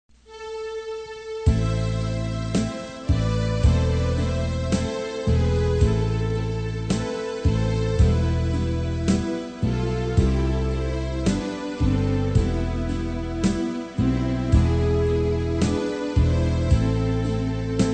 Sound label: BASS